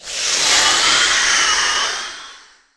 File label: spider monster threat